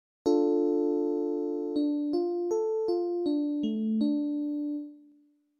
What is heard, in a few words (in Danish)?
skala d m